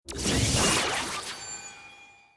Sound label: tooth transform; tak bites